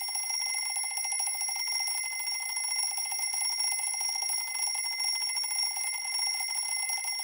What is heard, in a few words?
Clock Alarm Low Loop